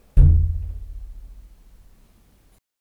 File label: Kick